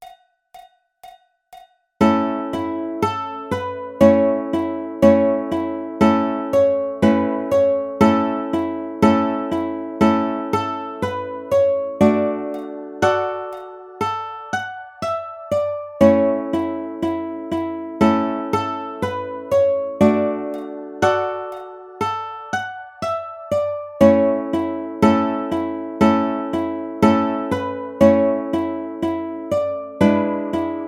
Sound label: Ukulele